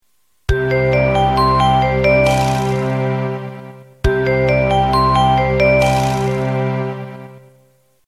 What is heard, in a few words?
i phone 5 sms ringtone